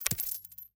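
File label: ui gold obtainment; Shelter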